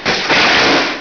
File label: zap; Source Engine; bshift